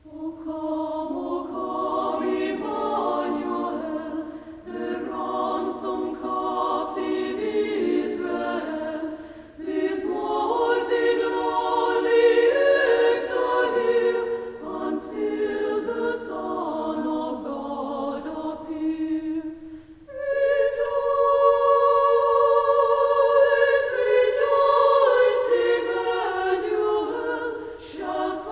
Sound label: Women Choir; O Come Emmanuel; advent 4 stir up your power o lord